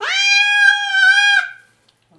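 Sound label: Cat Distressed Hungry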